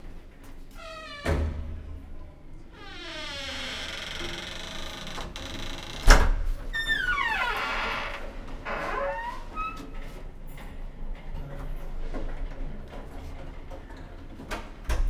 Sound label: Door